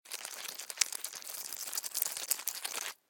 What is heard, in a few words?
insect slimy movement; children of morta